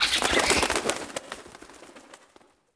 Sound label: birds Alight; creatures; Star Wars Obi-Wan; XBOX